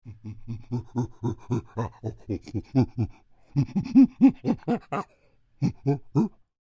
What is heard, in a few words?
Creature, Human Imitates Monkey, Grunts, Low; Monkeys